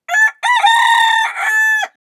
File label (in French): coq